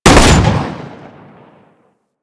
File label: Fire, Weapons; Strun